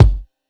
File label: kit, Yamaha Motif-ES8